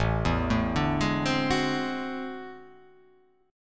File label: A Minor Major 13th, down